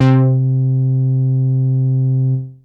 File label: Bass Synths hits and octaves